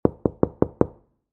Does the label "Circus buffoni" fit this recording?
No